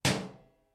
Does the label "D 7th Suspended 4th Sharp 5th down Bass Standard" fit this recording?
No